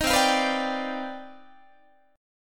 C Minor Major 11th C down Guitar 4A 4E 5A 5D 5G sharp 6C sharp; CmM11 strummed; JGuitar